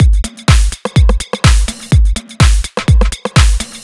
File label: Shimmering Hat, Drum Loops, 125 BPM; Sonic Foundry (Sony Creative Software); Underground UK House & Electro